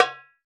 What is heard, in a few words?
Tabla Tak; Roland BK-5; Percussion